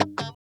CHUCK KUH, FUNK CHOPS, guitar

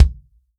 FUNK KIT, REAL DRUMS; Best Service ProSamples vol.43 - Real Drum Kits

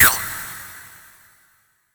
SHORT, SHORTNOIS

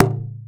LOG, INDIAN PERC

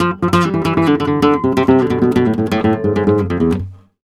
Guitar Picado, Flamenco, e; Picado 1; Best Service ProSamples vol.46 - Flamenco

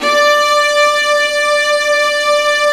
Violas Bright, String Sections; Roland LCDP13

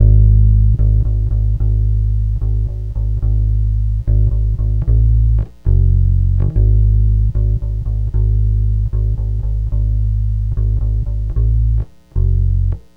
Bass G#; Zero-G Kocktail Kollection; Construction Kitz (Lounge); Volcano at Sunrise 074

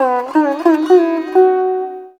SITAR; Spectrasonics; Heart of Asia

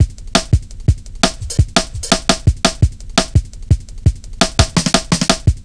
170, drum loops, drum & bass

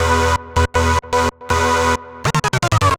Jupe Raver 160 E, future rave samples, 160bpm

